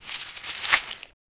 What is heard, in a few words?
harvest sound, harvesting